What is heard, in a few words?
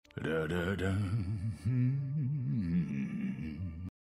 humming